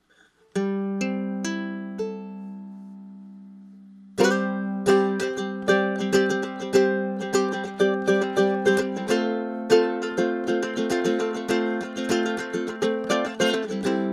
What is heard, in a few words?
uke